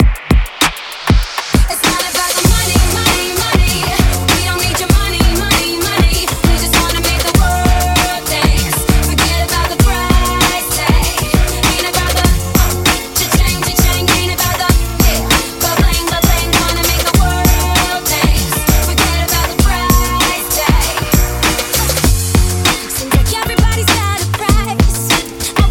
REDRUM 98bpm

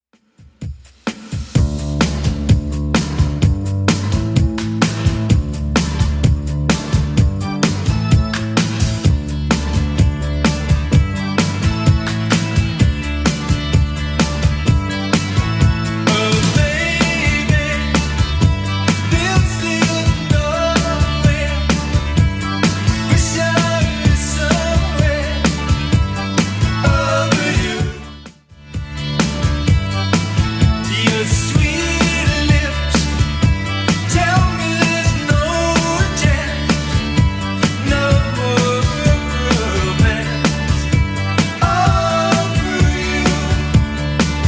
80s Club Edit 128bpm

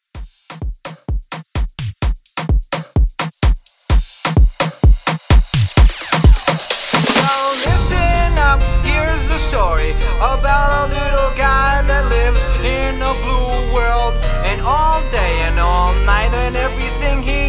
Afrobeat Re Drum

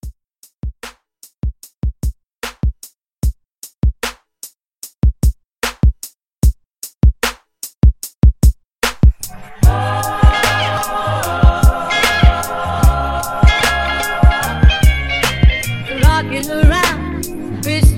Holiday Trap, 75; Re-Drum

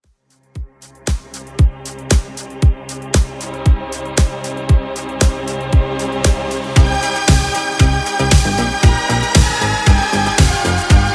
80s Redrum, 116 bpm

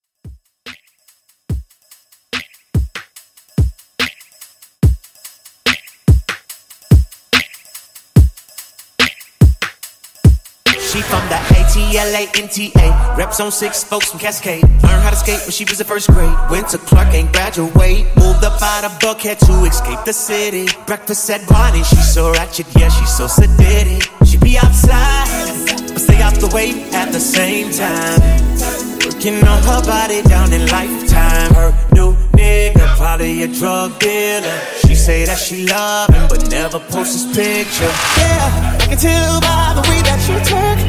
Hip Hop Redrum; Remixfreakz

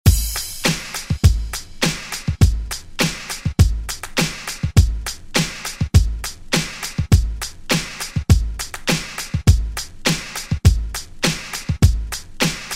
90s Re Drum

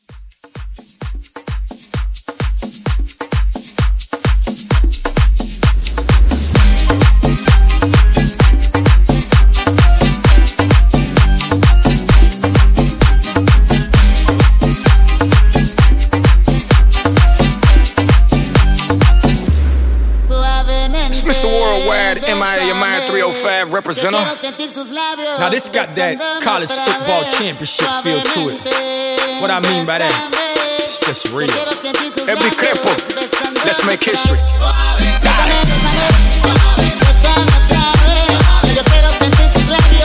Latin Club 130

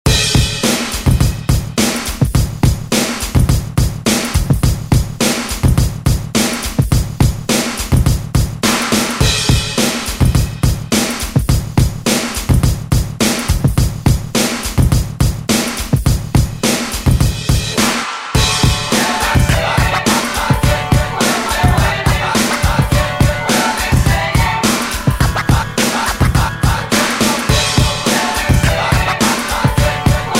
Oldschool Scratch Redrum; Deejay Hit

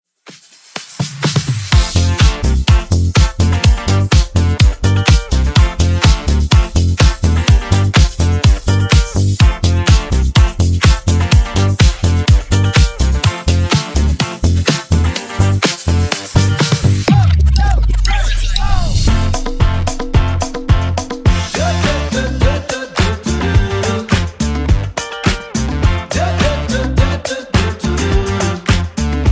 Funk Transition 125 105bpm

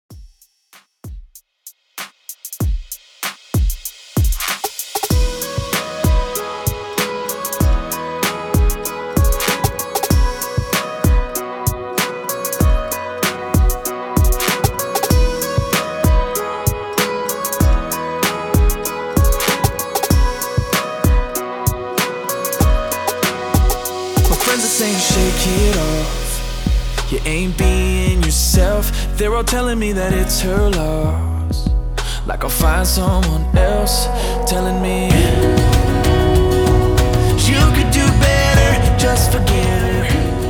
COUNTRY REDRUM 96 BPM

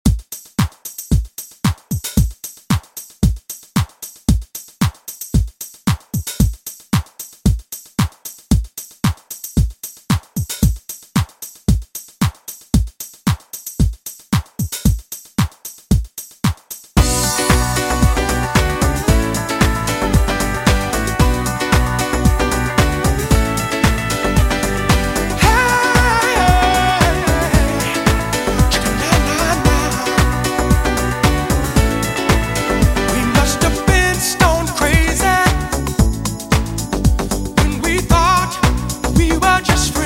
90s Dj Intro Outro 113Bpm

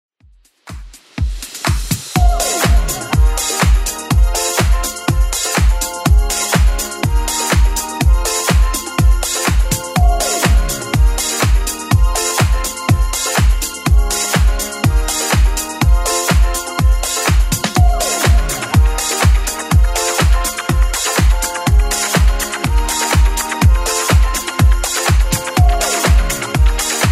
80s Club Edit 123bpm

